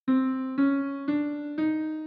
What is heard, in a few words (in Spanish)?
escala